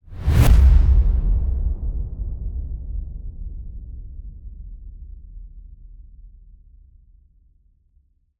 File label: Impact Low; KSHMR